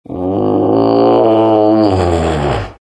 moans; Undying

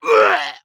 Grunt Hit

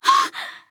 surprised; Skye; paladins

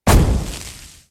Searing Arrows; Clinkz

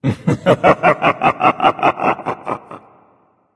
laugh; Smite; Scifi Anubis

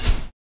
projectile launch; Enchantress

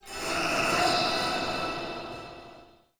effect egg; feheroes

